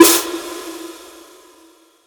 fill snare

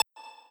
lead

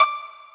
Pizzicato; osu

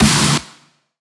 perc

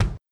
kick; osu

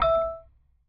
Bell E3

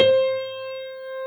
jazz C5 Whole note

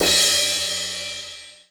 soft hit finish

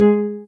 soft hitwhistle; osu

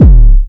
Kick Bass

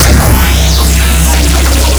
beam

